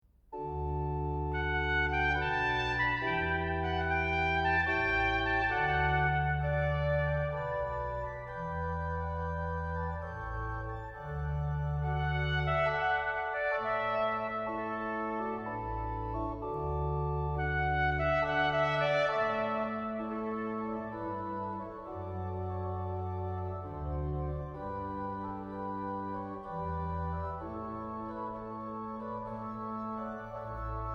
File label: Concerto In D Major For Clarino - Largo, Trumpet And Organ; JF Fasch